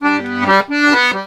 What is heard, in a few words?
Accordions; USB Soundscan vol.40; AKAI